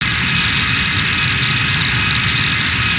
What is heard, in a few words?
ATTACK; 1997 MMX LAN PACK (win 95)